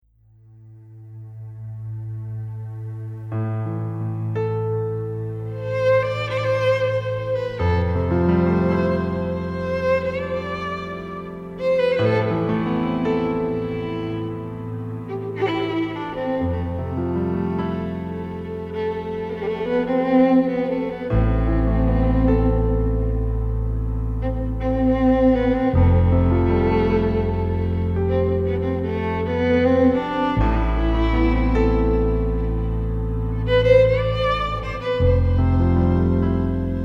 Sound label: ukrainian bell carol